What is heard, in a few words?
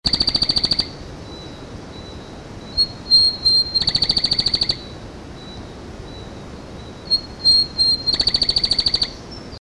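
brown emutail, Madagascar; Madagascar - 20th Oktober to 16th November 2003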